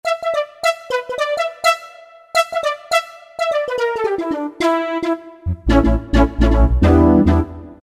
Flutish Brass, Roland D-50; Factory preset demos

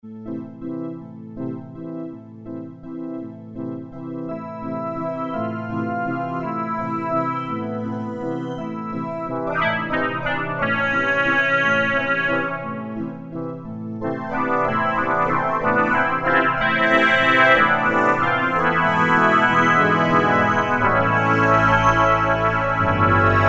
Yamaha TG77

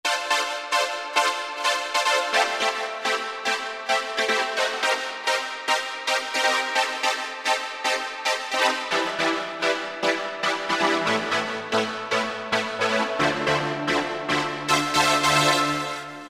SC Housy

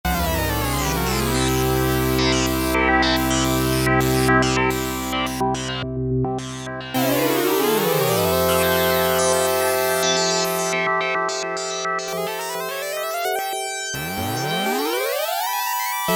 S-H PORT REZZ, Oberheim OB-8; Factory Patches

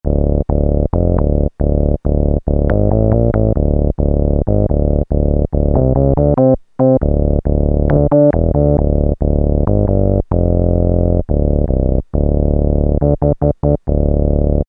Fat Bass, Korg PolySix; Factory Presets Bank A

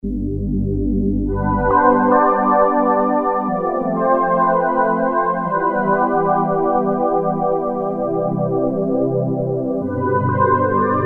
Voice Pad; Factory A

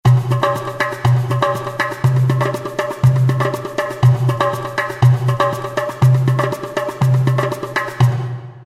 2Bar Loop; World; Roland SR-JV80-05

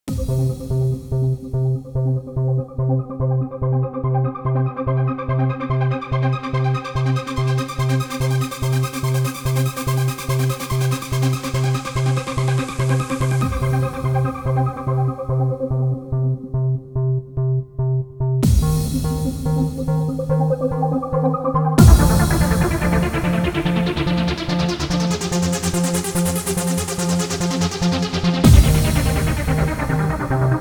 Korg Radias-R

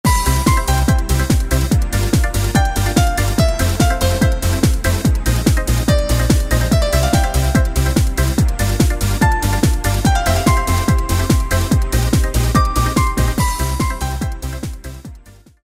EuroTrance, Roland D2

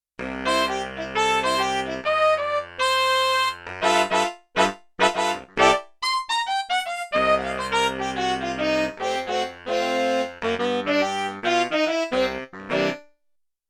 Baritone & Ten & Trump, Big Band; Yamaha TX16W; Big Band Performance

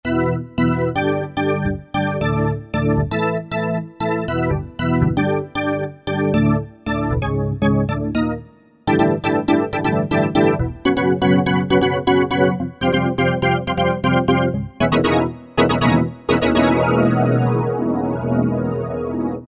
ME Mojo, Yamaha TG500; Internal Voice I

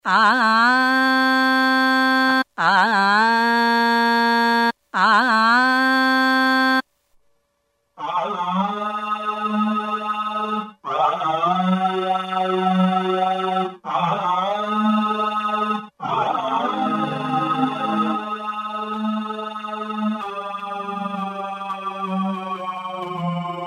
PITCHSHIFT DOWN, Korg Wavestation A-D

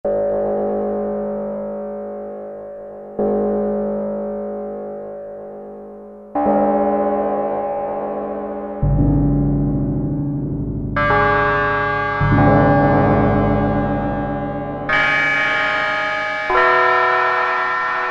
Synthe Gong, Korg Poly-61M

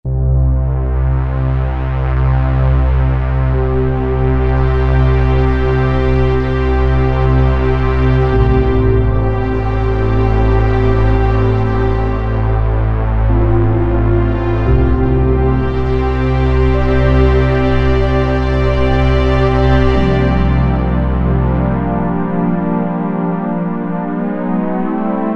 Padfaze, Yamaha TG77; Factory Presets

